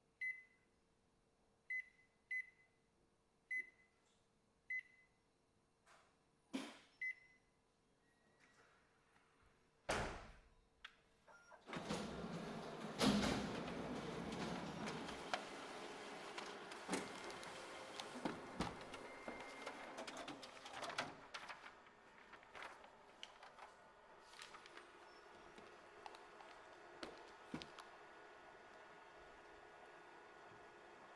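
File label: sounds of photocopier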